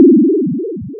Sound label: duck quack